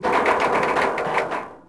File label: tram cables sqeuak